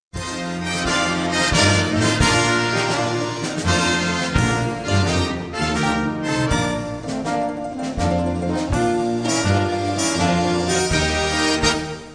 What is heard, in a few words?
instrumental